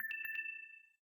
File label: Low Battery In Call; LG; F520L-AKA